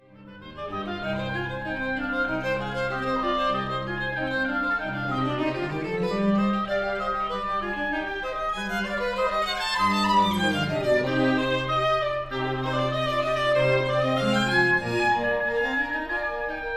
Concerto for Violin and Oboe in C minor, I. Allegro; Bach